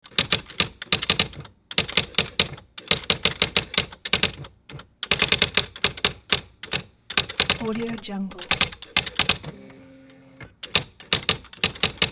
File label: electric typewriter typing a letter